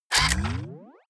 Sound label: turn on off